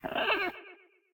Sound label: phoenix base chirp; smite; npc